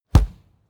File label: football kick